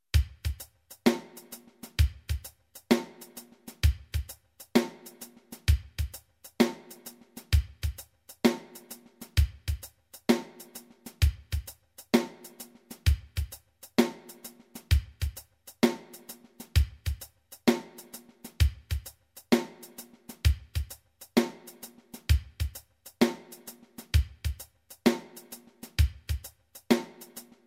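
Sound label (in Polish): akordy hendrixowskie perkusja, guitar; cwicz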